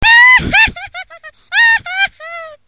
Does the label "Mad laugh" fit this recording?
Yes